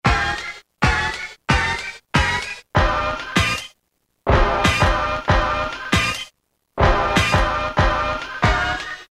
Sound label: 2 Tone Hit; Roland M-DC1